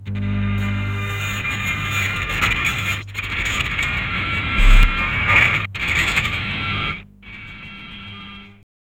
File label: sudden record scratch halting the music